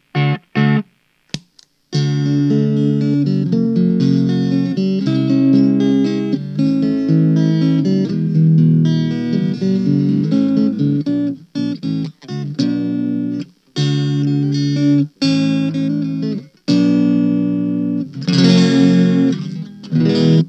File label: Chamelion, filters